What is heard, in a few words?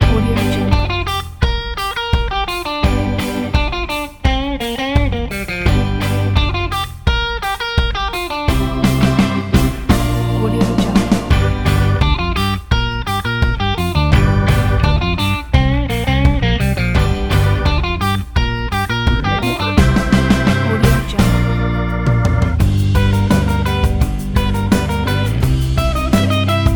60s old school rock